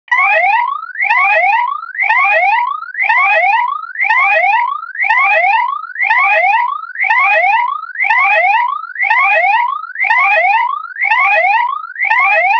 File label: bulldozer alarm, alerts